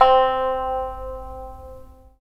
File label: SAN XUAN C5; Spectrasonics; Heart of Asia